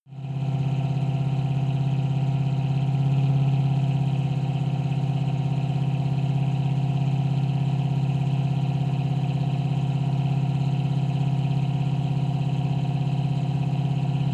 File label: car camaro high rpm exhaust